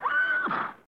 Horse Whinny Neigh Di

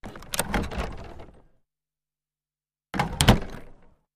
Carriage Door, carriages

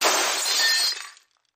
Glass Crashes Small W; windows & mirrors

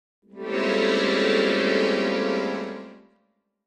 Accordion Bass Keys; keyboards

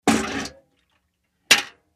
Bucket Full Set Down; barrels buckets & cans

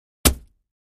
Axe Paneling 1 Side, axe hits

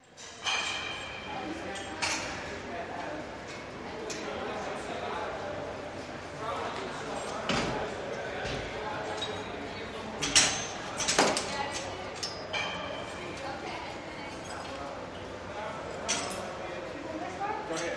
Exercise Room Walla

